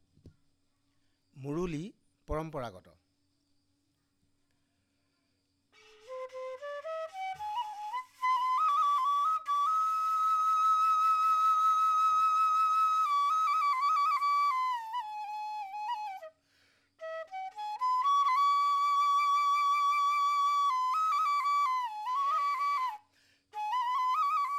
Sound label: Muruli Assamese Traditional